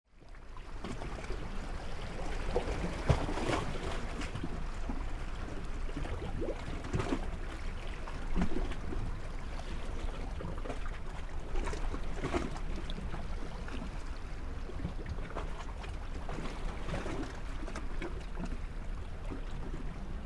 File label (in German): Meeresrauschen Bootssteg; mugissement marin parmi passerelle d'embarquement (1:38